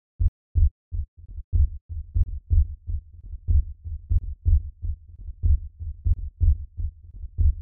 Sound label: tech house bass - em - 123; loops; tech house bass samples